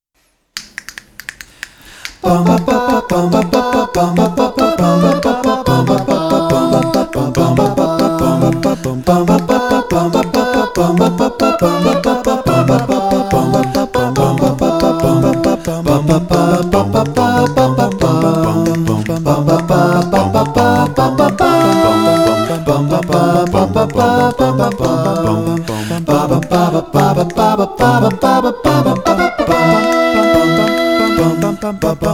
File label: acapella; ocarina of time